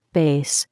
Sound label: bass